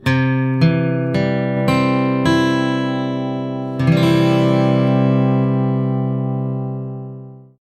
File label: classic msg tone